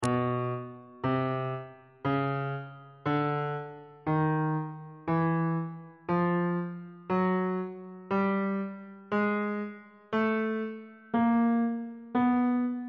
b dorian mode chromatic scale 1 octave on bass clef